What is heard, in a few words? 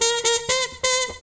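brass trumpet; AMG - Now CD-ROM (Roland)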